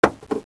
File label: trunk, automoveis